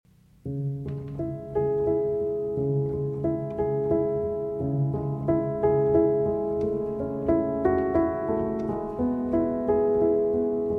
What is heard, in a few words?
Piano